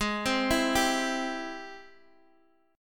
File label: G sharp Augmented Major 7th, Guitar, Standard; arpeggiated; x,x,6,5,5,3; JGuitar